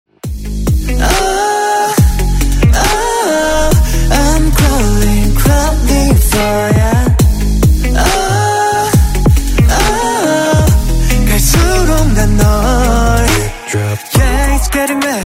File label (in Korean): Drip Drop, 무료 벨소리